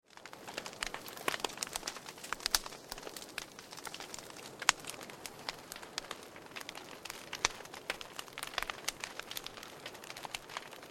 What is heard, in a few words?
BBQ background; fire